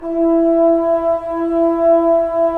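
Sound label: brass trombones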